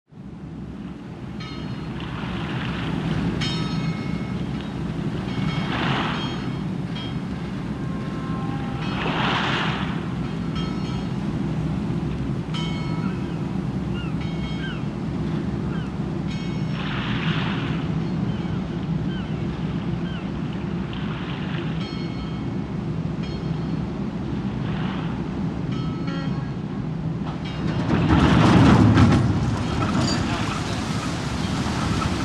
Ferry Dock Buoy Bell

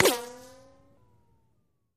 Bullet Ricochet Sin

